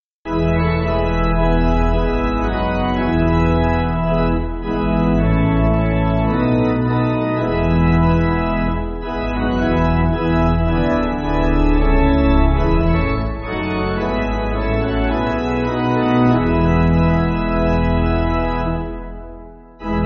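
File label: German Hymn, Pipe Organ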